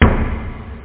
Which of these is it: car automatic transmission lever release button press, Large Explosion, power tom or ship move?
power tom